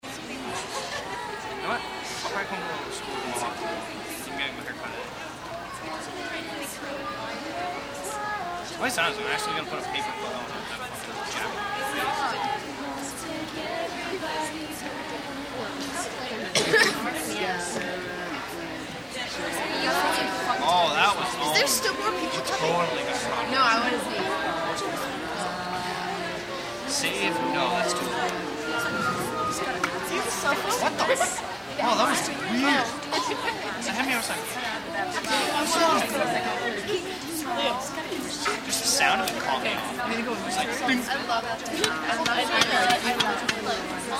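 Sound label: Crowd Teens Graduation Chit Chat Close Up; Backgrounds; Crowds; BigRoomSound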